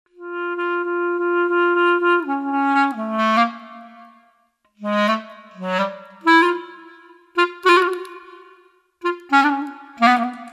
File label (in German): saxophon